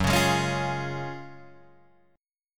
F sharp Major 7th Suspended 2nd F sharp, Guitar Drop D; 4,4,6,6,6,4